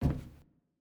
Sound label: Footstep Metal; Silco